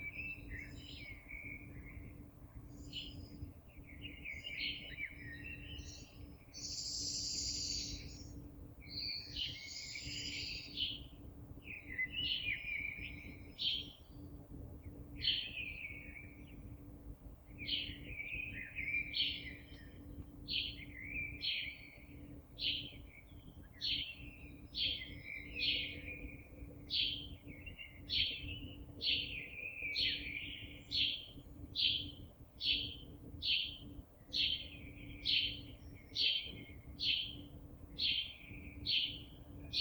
oiseaux

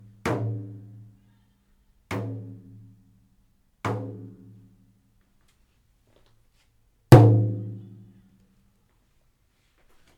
Tambourin naturel 30cm

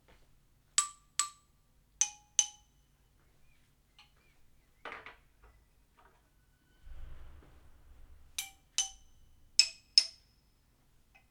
Lames Vrac

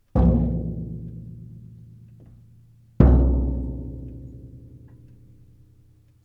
Grosse Caisse

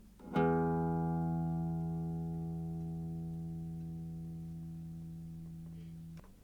Electroacoustique mi, guitare électro acoustique

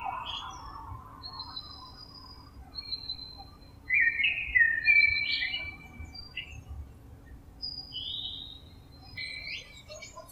Merle Mesange Charb; oiseaux

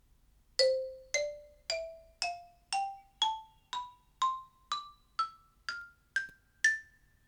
Xylophone Diatonique

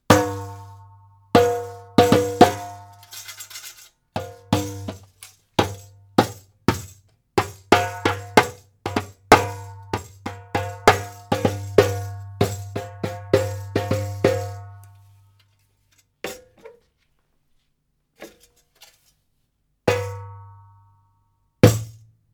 Darbouka

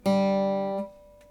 Electroacoustique sol

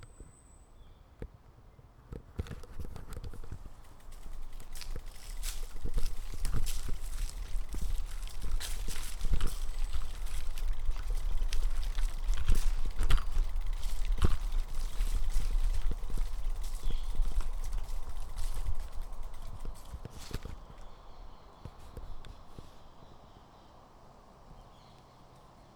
Poudrerie oiseaux

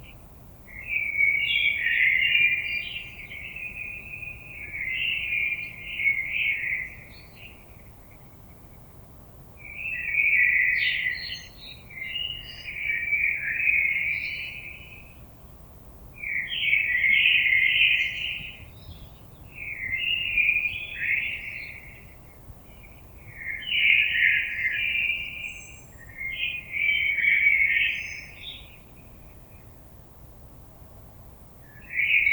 oiseaux

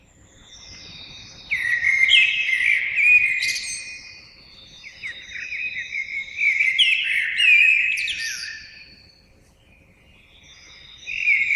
Merle la nuit, oiseaux

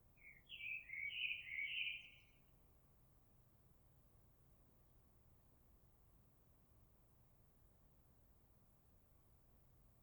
Merle

